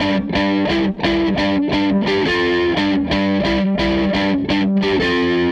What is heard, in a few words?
Tele Guitar Loop